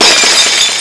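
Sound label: SHATTERED; HAUSHALT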